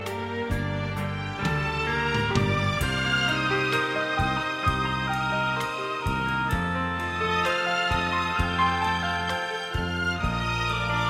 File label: duduk nostalgy; classical